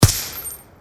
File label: Deagle